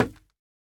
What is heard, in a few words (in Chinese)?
竹木破坏声